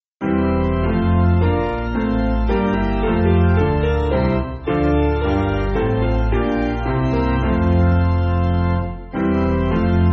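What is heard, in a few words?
Piano, Music Backing; I Sing The Almighty; Forest Green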